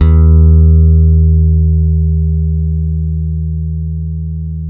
F 3, compresence, bass; Spectrasonics; Bass Legends

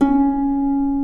Harp C#3; Mo' Phatt; Digital Sound Factory Mo' Phatt

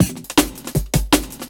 Beat, Futurist Drum & Bass; Drums & Percussion

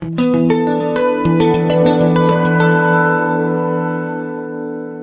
Guitar FX, Stringed Instruments, World Pop; Sonic Foundry (Sony Creative Software)

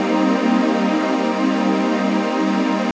Cmin, Pads; Zero-G; Total Drum Bass

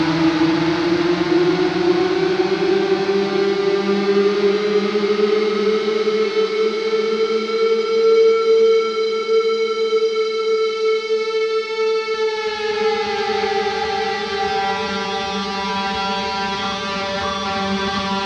guit longrise; Sonic Foundry (Sony Creative Software); Ugly Remnants VOL-2; UR 2 FX (Long)

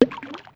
Ball In Water, Yamaha DD-35

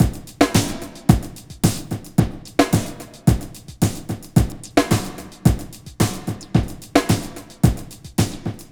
Basic, beats; Sonic Foundry (Sony Creative Software); Ugly Remnants VOL-2

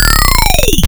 E crushed to hell, bass

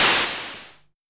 Simmons Clap

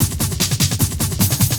Beat, Industrial

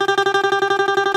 BALALAIKA, Prima Balalaika, Plucked Strings

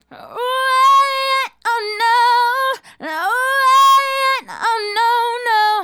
Female Solo, Hip-Hop and RnB Vocals

